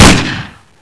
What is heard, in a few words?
ump45